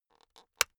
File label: plastic slowly pressured click